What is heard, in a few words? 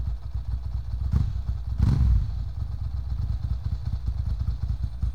duck idle